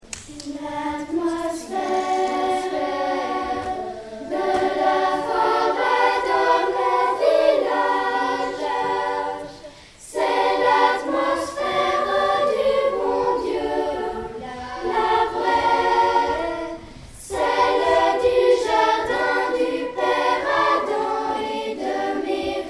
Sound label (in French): Atmosphère